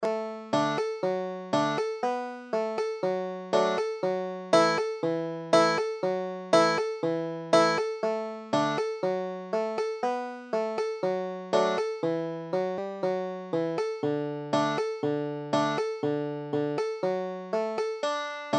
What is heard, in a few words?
banjo